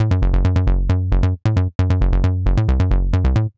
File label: 134 Techno Bass F#min; Dance DJ; Digidesign; Pro Tools; Pro Tools Essential Loops